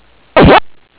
eat; svencoop; pacman

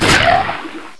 splauncher altfire; svencoop

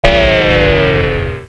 failure sound

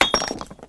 grenade hit; weapons

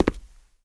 pl step, player

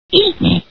eat

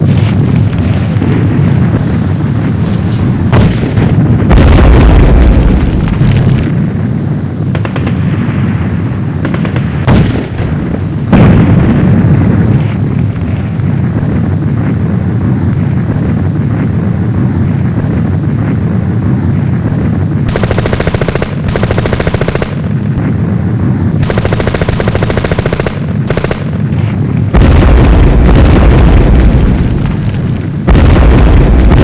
bombing; cstrike

